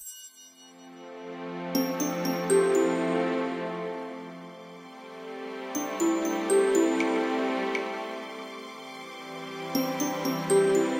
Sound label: Bonus Level Music